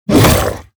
Pickax Miner attack; KuberaMine

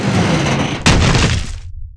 death

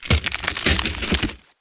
plant Close; Kethotum

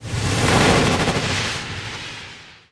attack

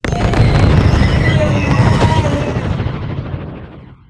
blade death

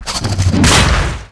attack

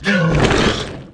attack

